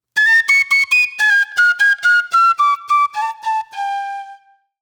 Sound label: Shrill Flute; Sonic Foundry (Sony Creative Software); Manhattan Flutes